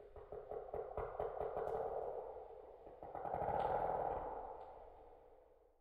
Mystic Forest Creaks

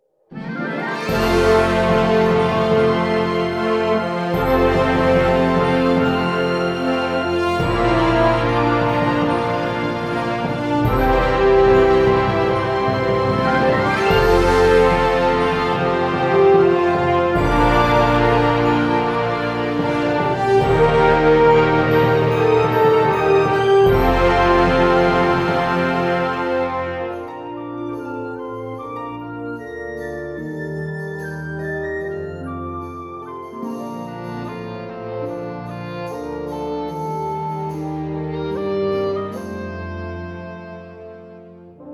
Game won

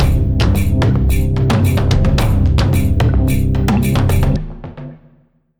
basicMood Cueva Bosque

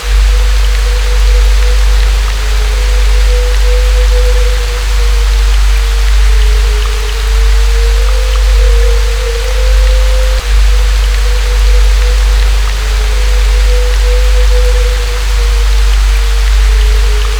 Haunted Rain; luigis mansion dark moon; 3ds switch

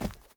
Footsteps Grass Run; Contrast

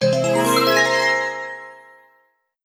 Succes!; paper mario the thousand year door complete; GameCube version

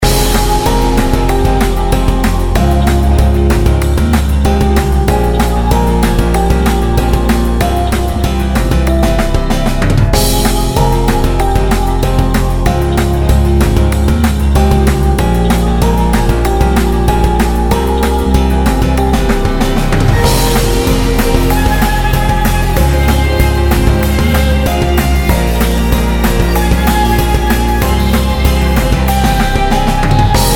Raid Boss; Mist Train Girls

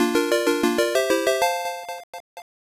Test Start; Mezase Pro Yakyuu Pico gamerip 1995

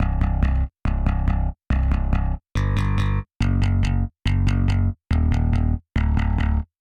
Drums and Jingles Explore Bass; Metal Tales Fury of the Guitar Gods

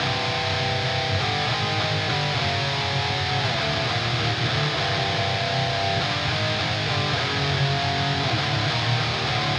Evil Guitar A

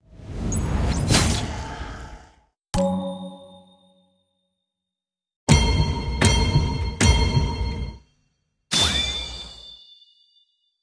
Ui Combat; Archeland

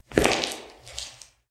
Guard Walk Passive

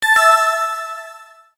Casino Win; Mist Train Girls

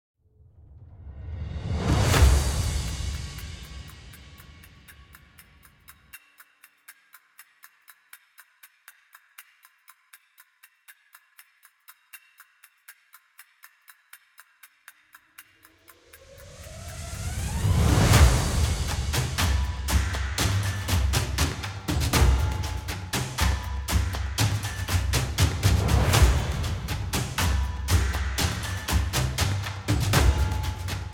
Real perc Phrase; Worms Rumble; PS4 PS5 Switch Windows Xbox One Xbox Series XS

